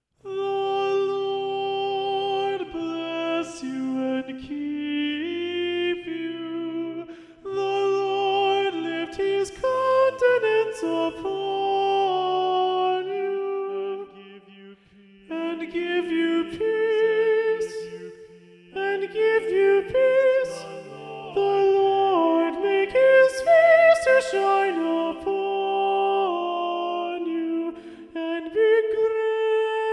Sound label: Soprano Predominant; choirs